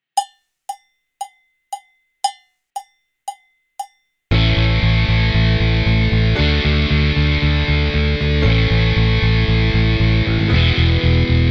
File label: without click; Drum Kit exam